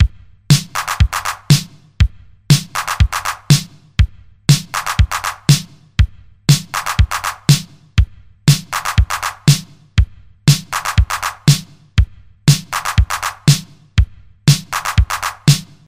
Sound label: 1980s style drum ringtone